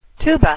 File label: voc tuba